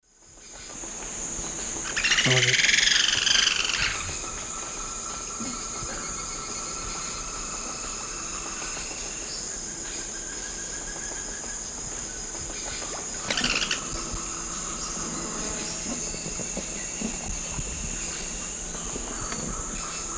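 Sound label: Galbalcyrhynchus leucotis, song playback; S. America; Eastern Lowlands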